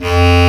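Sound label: Bass Clar mf F1; Digital Sound Factory Virtuoso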